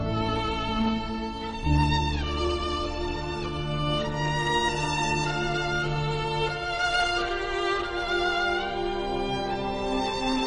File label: sad violin